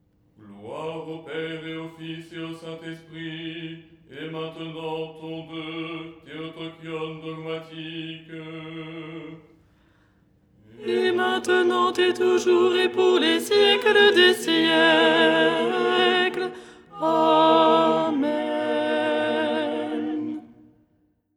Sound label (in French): alto